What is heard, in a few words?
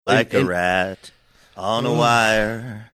singing like a rat on wire